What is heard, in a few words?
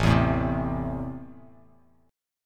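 A Minor, chord strum; Am strummed; 5,7,7,5; JGuitar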